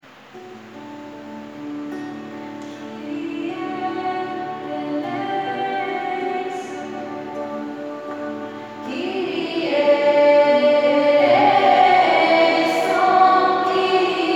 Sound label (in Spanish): Kyrie eleison carol